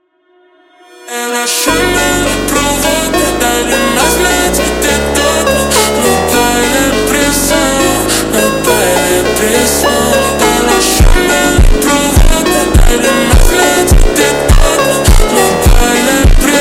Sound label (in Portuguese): super Slowed, phonk